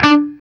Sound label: CHUG D 3, Guitar; CHUGS; East West; Steve Stevens Guitar Samples Collection